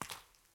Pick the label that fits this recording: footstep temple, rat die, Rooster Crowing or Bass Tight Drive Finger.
footstep temple